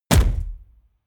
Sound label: explosion solid impact; explosionen; comedy-cartoon; soundpool